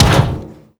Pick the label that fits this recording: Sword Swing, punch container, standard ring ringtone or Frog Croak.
punch container